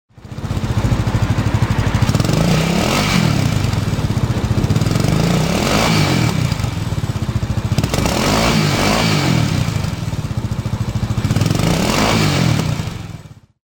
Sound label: rincon, exhausts honda